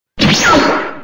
teleports

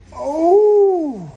ooooh; burns

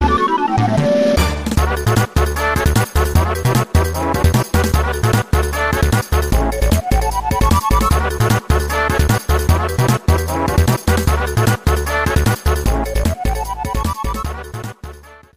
victory theme music; super mario rpg

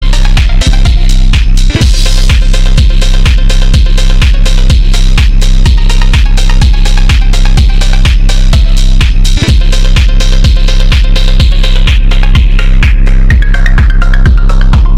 phonk

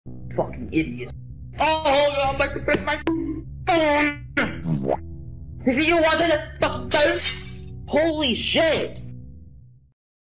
break phone; bambi

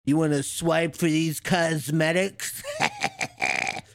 swipe

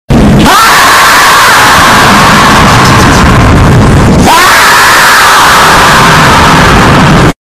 marmot screaming ear rape

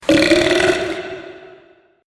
monster burp

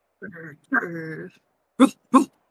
grr woof

